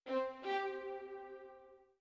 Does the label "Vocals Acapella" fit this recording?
No